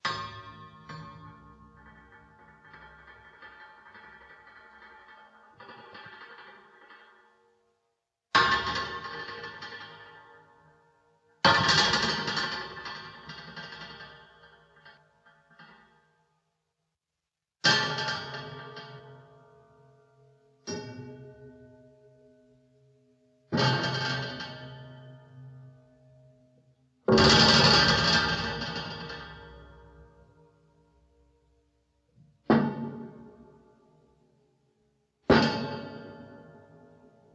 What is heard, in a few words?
Laundry